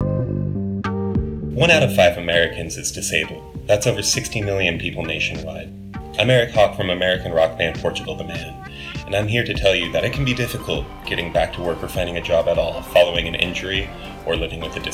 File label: Noise Pollu